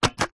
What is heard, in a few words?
leisure toy plastic gun trigger pull